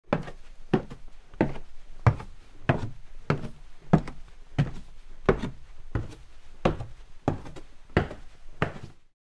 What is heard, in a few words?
climbing stairs